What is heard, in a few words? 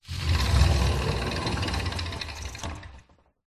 household wooden sliding door roll light room reverb; zapsplat